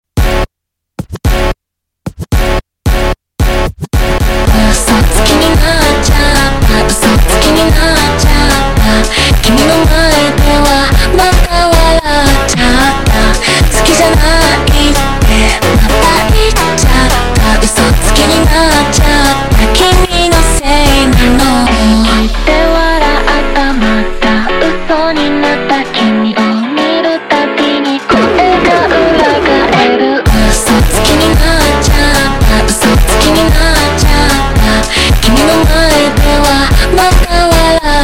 super Slowed, funk

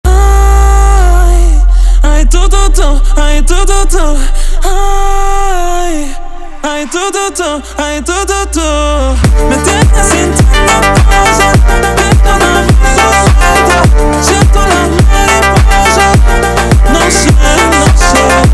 slowed, فانک; funk

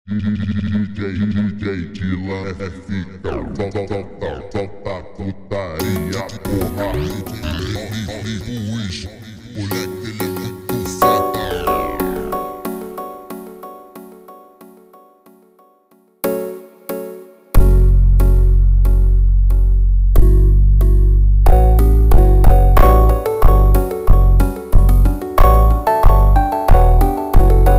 Funk instrumental Super Slowed

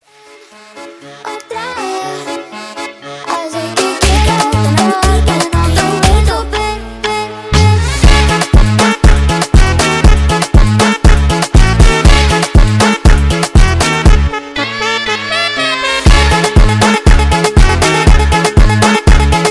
sped up, funk

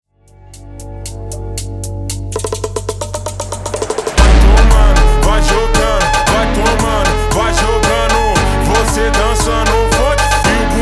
Funk Slowed

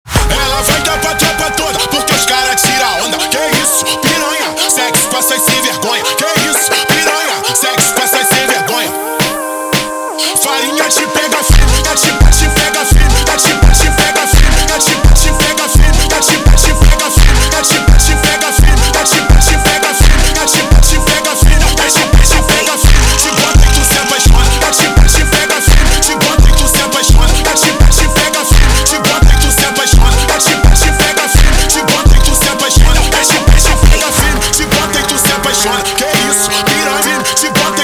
Brega Slowed; funk

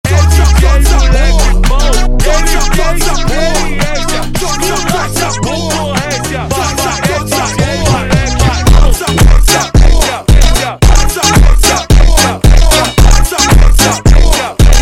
Funk slowed

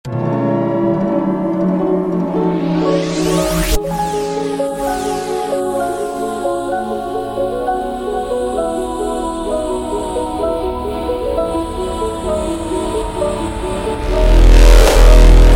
Hardstyle slowed

